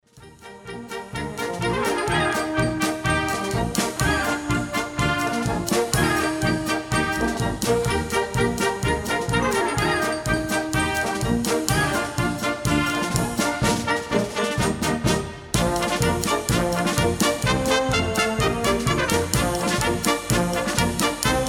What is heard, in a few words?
Helena Polka; oktoberfest